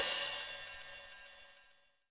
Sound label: HH, Real